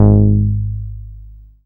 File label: BAS MKS-50, Roland